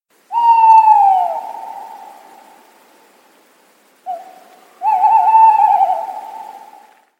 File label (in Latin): strix aluco